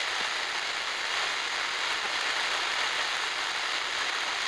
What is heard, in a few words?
console static; electrical sparks